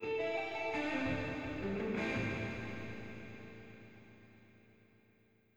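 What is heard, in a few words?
Guitar Loop Mono Verb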